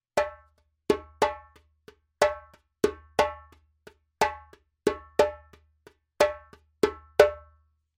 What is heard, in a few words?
djembe; djembebog oevelse